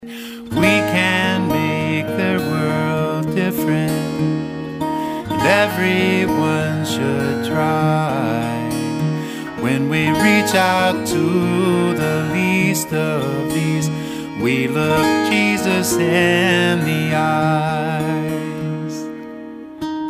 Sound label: Hymn